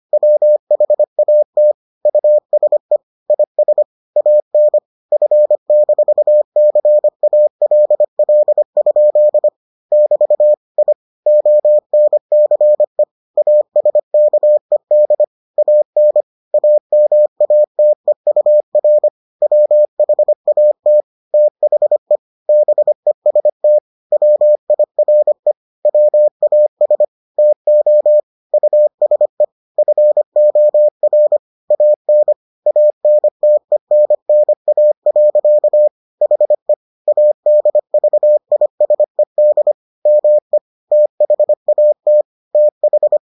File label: morse